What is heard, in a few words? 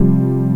chord